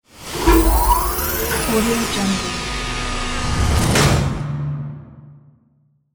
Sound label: futuristic elevator